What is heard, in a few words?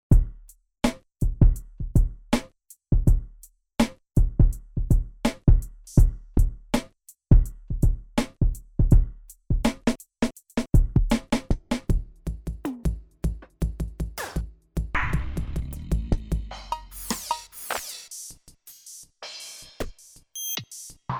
Hip Hop Kit